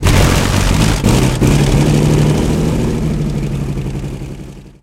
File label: Cromwell engine load